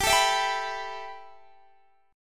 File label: A 7th Suspended 2nd, G